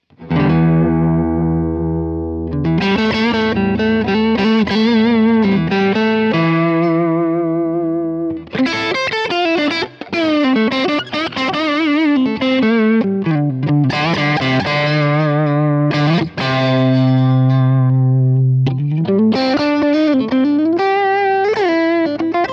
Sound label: Charvel Signature Neck to Bridge Crunch; Marco Sfogli